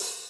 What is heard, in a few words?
Funk-Groove Drumloops